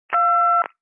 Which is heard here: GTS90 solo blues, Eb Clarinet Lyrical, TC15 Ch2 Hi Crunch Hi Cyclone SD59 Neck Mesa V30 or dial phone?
dial phone